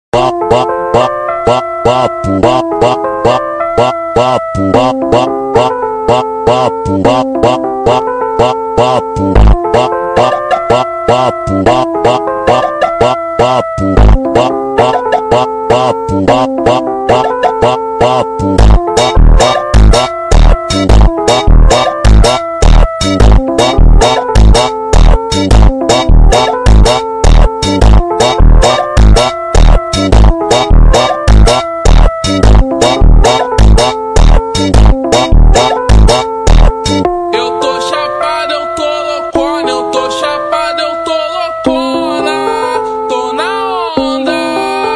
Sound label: Liminal Funk, Super Slowed